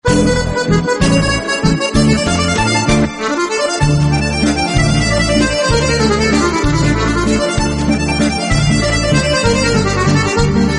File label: sentimental waltz